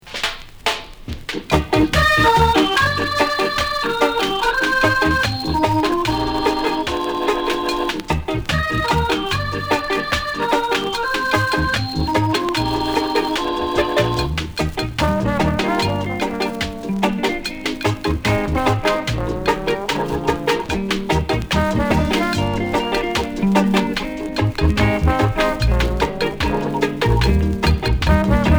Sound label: REGGAEMATIC SOUNDS